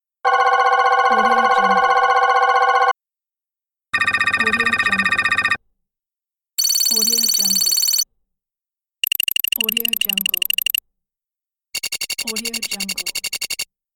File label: loopable score counters